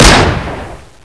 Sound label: glock fire; weapon; revil; socom